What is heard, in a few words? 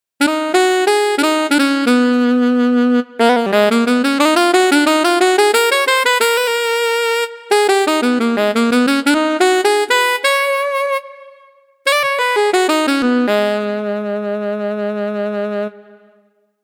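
Alto vibrato Legato, sax; Montage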